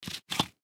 Surgical Tool Set, Surgical Tool Set Down Acrylic Paper; Paper Towel Surface Surgeon Surgery Large; Hospital; Emergency